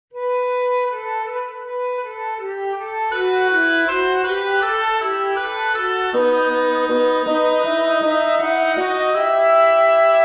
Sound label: interlude fugue; The Quest Memories